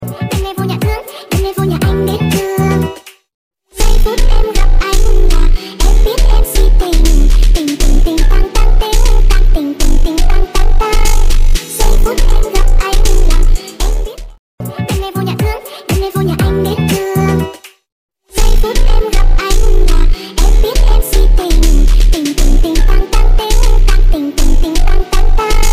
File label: speed up phonk tiktok remix